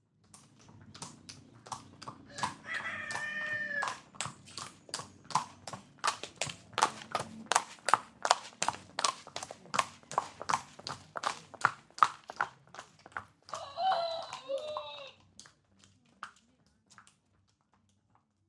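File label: wild west sounds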